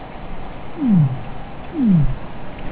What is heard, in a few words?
sei whale doublet